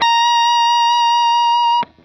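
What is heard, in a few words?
fuzz guitar vibrato; MAYFUZZ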